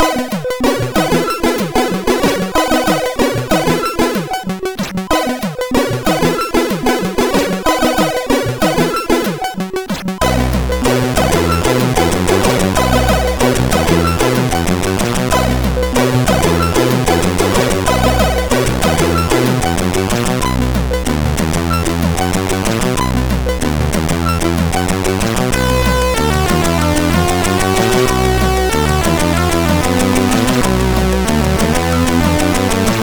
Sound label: New Wave, sid MOS6581R4